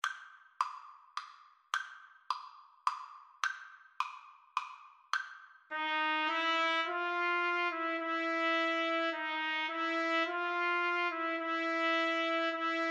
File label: trumpet cello, Trumpet-Cello Duet